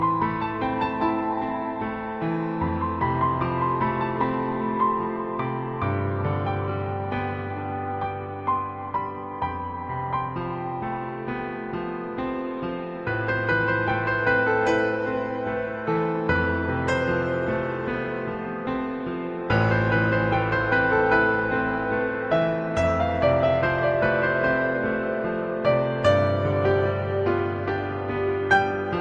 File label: Piano Ballad